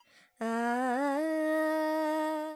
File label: Dorian, Vocals Carnatic Female Alaps 70 G Dry